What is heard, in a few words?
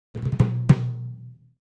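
Timpani Hands Short, mallets timpani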